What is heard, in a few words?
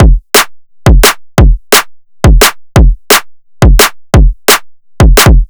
DNB KICK SNARE LOOP 174